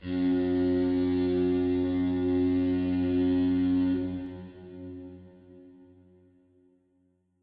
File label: foghorn